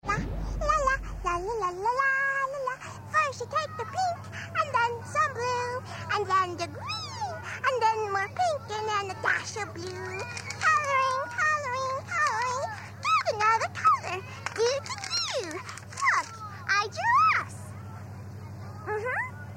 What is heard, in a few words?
bubbles coloring